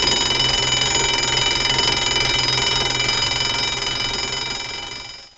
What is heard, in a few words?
alarm clock; EFFECTS; CLOCKS